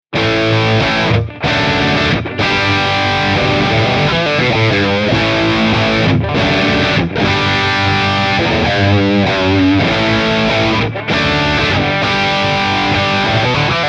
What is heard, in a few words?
Guitar Rig 6 Matched Cabinet; Fire Breather